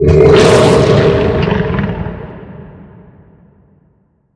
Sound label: kraken growl; smite; poseidon